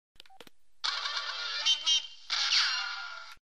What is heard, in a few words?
meep meep